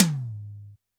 Tom, drum

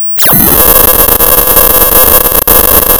Sound of tearing paper, center

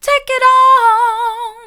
take it on mid, vocal

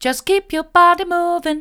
just keep your body moving mid, vocal

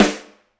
Rim, drum

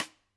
Sd St, drum

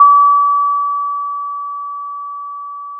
Sound of a school bell

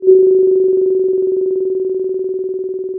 pop, Sound of a phone ringing

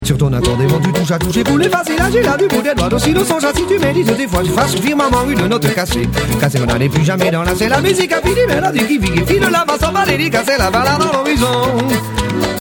loop mono

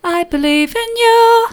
i believe in you soft, vocal

